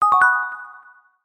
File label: multimedia notification mallet synth dreamy; zapsplat